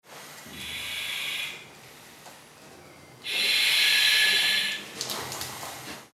Feuerwehuebung Atmenluft

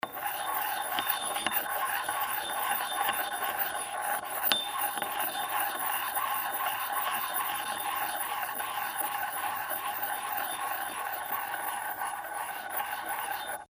Moerser

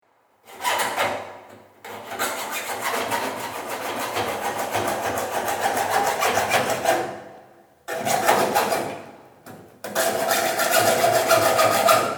Saege

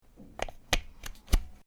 Stecker einstecken

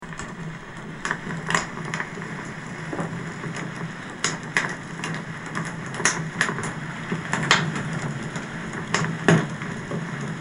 Feuer

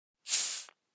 Luft aus Flasche lassen

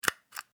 Locher

